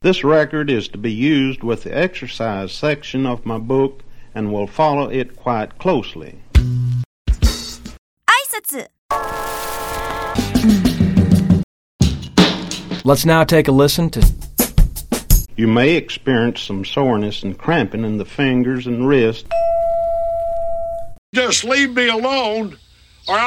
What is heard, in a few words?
cuts beats breaks samples stuff; threepeeoh